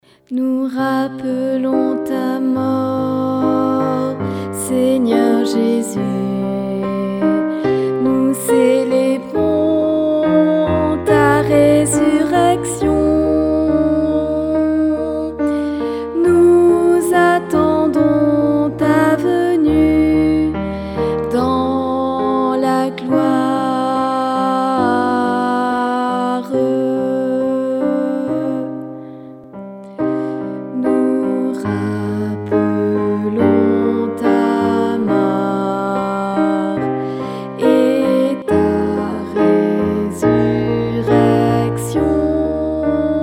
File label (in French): Anamnese, Voix; Messe De L Agnus